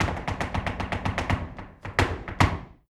Flamenco, ZAPATEADOSOL

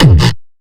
bass kick

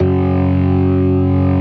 STOPS, Synth and Organ

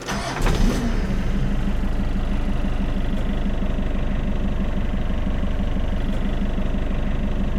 ENGN STRT; AKAI S-Series CD-ROM Sound Library VOL-6

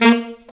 SAX Alto STAB, Alto Section FX

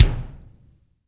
BD, BD REAL

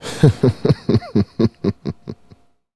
Male Laugh, Hip-Hop and RnB Vocals

